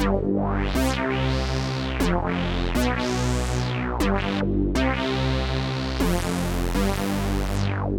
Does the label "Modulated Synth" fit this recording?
Yes